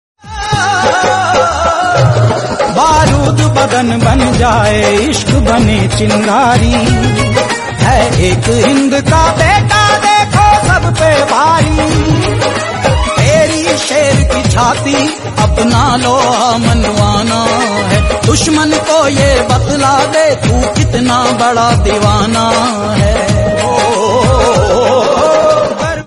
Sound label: Desh Bhakti Bollywood